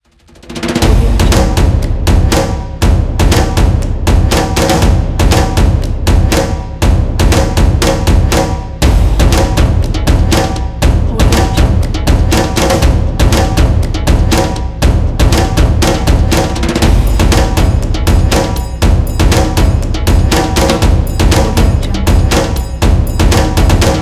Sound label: tribal percussive action intro